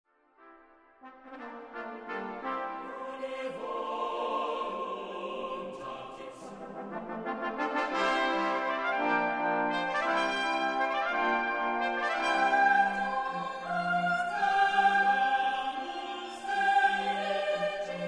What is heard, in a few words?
Gloria Allegro vivace